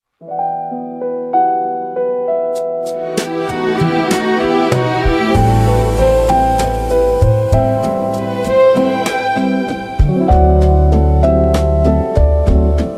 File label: Romantic Jazz Ballad